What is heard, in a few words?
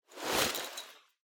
Pocket Watch Pocket Coat Take Out; 懐中時計; ポケット コート 取り出す